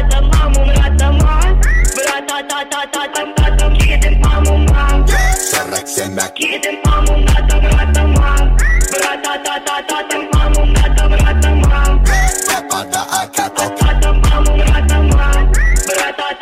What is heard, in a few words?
beat bike